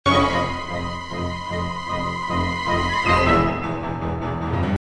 orchestra effects, Woodwind runs; woodwinds; epic orchestral track

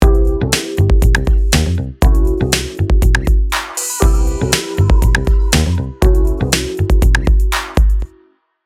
Glue Dry, Before the 'glue'.; 3d mixing; Reverb placed on the master output to ‘glue’ the mix together.

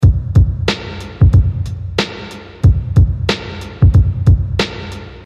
Loop, dusty drums; Imperfection preset

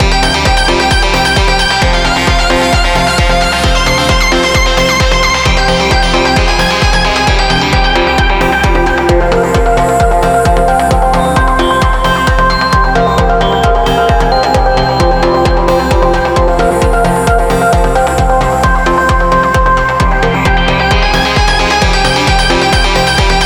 song with kick; Novation Launchpad; Ableton

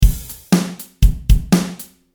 Drum Exaggerated Swing

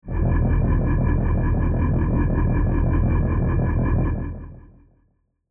sci-fi vehicle sfx; synthesising sci-fi vehicle sfx; creating tones

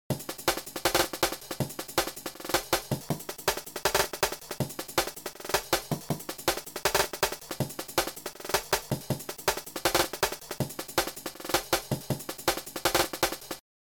Pitched, drum; drum kontakt; advanced drum editing in kontakt

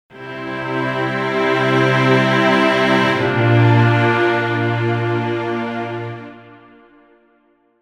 I-bVII; altered chords

